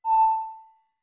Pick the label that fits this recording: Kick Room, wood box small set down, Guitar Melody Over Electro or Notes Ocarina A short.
Notes Ocarina A short